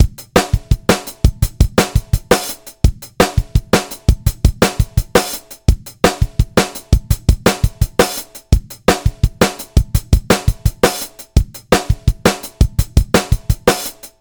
IQ Series Limiter V2 Beat hard pump 50 clip 25; HOFA

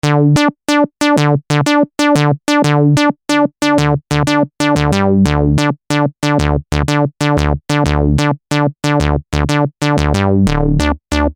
Sub OSC

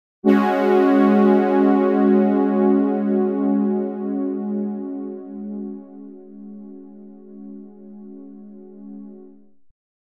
Pad Clean; Dreamstation

